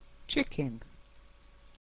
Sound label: chicken